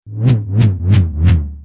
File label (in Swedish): svisch; SMÅ